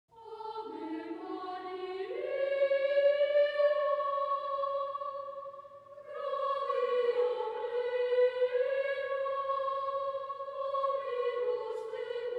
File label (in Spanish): polifonia